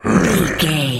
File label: zombie voice general emote